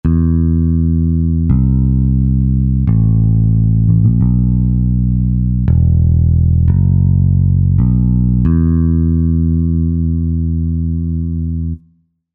Pick Bass, synth

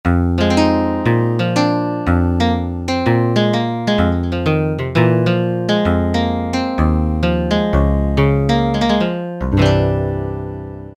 6 String, Kawai K1m; Factory patches - I banks

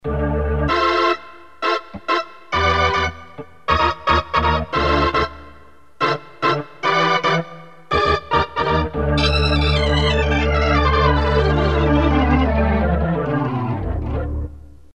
Organ

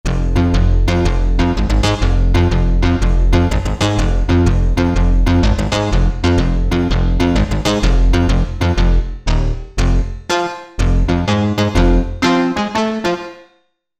2 voices; Korg MSC-12S Synth 3

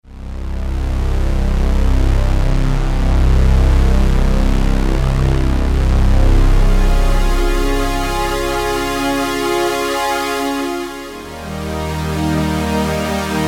Memory MG Pad 2, Roland JD-990; Vintage Board

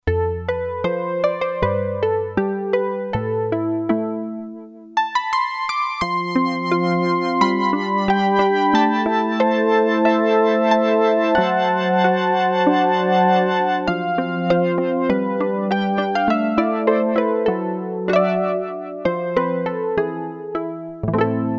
Pizzicato Sound, Roland Juno-60; Factory presets

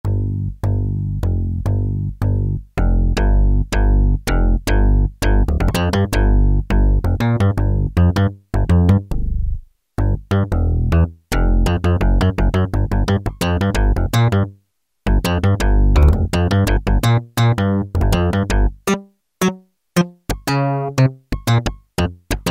SLAP BASS, Casio VZ-10M; Internal factory combi

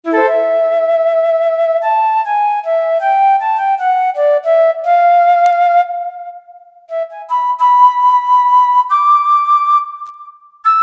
Moody Flute

